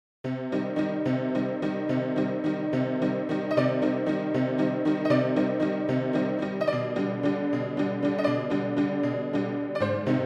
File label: broken piano